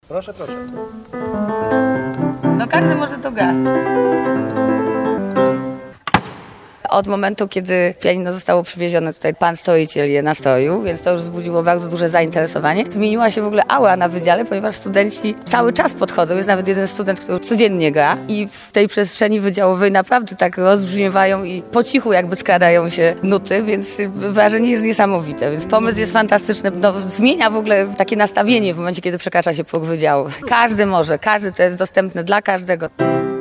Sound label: piano